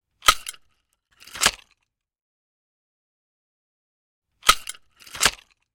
Numchucks; Hollywood Edge; Martial Arts and Human Impacts

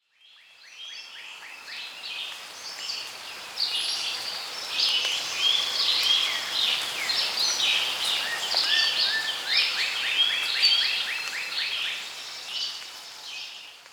Monkey Crowd; Pitch Black Audio Pong

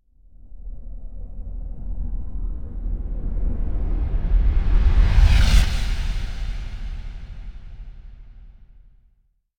Whoosh Air Rising Finale; Hollywood Edge; sound designer's toolkit v2

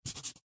Black Stone; Blind Quest - The Frost Demon

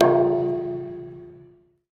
Baseball Hit Metal Pole

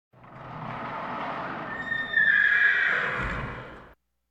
Animal effects snorts grunts farm ambience

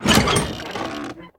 Lever Metal Panels Open; Doors

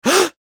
gasp male; Pitch Black - A Detective's Demise

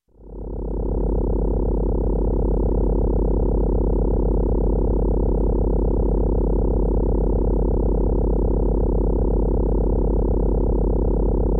Space, Ship Space Ship, Idle, Electronic; Sound Ideas 2000 Series

